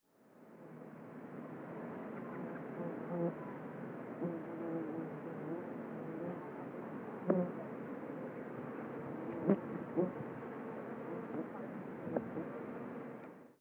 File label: Fly, Garden